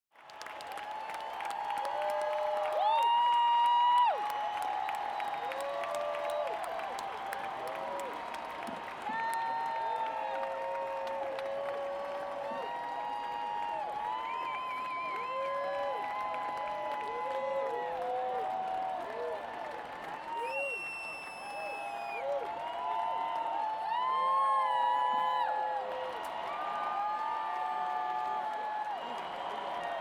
Crowd Cheering Exterior, Close Cheers, Encore Call, Stadium, Applause; Rose Bowl Stadium; Surround; 5.1 LCRLsRsLf; 2496SoundEffects; Surround At The Show 1 5.1